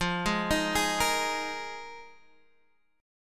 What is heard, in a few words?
Eb Suspended 2nd Suspended 4th